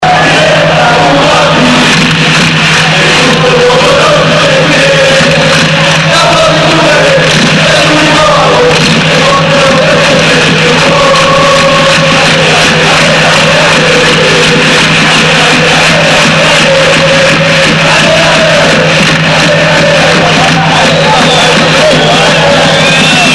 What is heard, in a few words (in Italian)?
CORO CON GOAL; E' Maradona; ragazzi della b